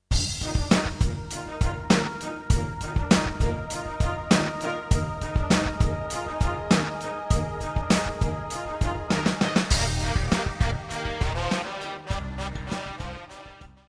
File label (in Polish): Deszczowy rock roll instrumentalna